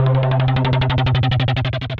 Scrumptious Sync, Wavetable Synth Rhythmic; Instruments; Ableton; Factory Packs; Synth Essentials

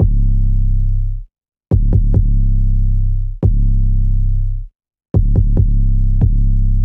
808 Bass C 70 bpm; Rhapsody

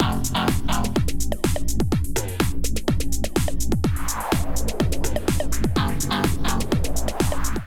kit groove 125 bpm; Splash Mountain Kit

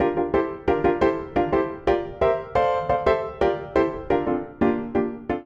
Ostinato G Dorian 176 bpm, Upright Piano; Soda Bop; Upright Piano by Spitfire Audio; Ableton; Factory Packs

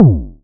modular pitched, Kickbank; Ableton Foundation FreePacks

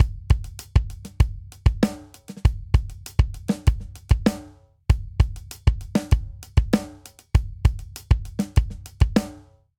Straight Hip Hop Breakdown 98 bpm; 4 4; Ableton; Factory Packs; Drum Booth

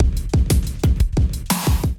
Kit; Drums; Highway Kit; Ableton; Drive and Glow

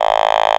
Otamatone; Ableton Foundation FreePacks; Foundation Glitch Toys & LoFi

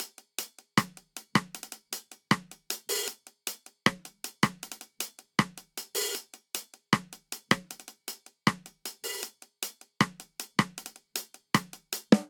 Straight Reggae Snare & Hihat 78 bpm; 4 4; MIDI Clips; Ableton; Factory Packs; Drum Booth

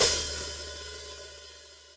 Hat Edge Open Three Quarters, hihat; drum hits; Ableton; Factory Packs; Drumdrops Recording Hybrid Kit

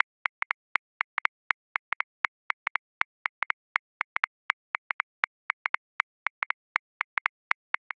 Swing Six RnB 6 8, Drum Booth; Grooves; Ableton

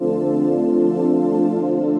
Dark Pad; Synth Pad; Dark Kingdom Pad; Ableton; Factory Packs; Synth Essentials

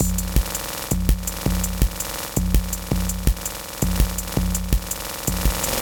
606 165 Beat, Drum Machines; Cathode; Ableton

